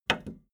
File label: video tape player plastic stop click slow